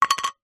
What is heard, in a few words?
biscuit set down on plate